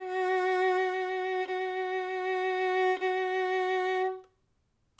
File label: violin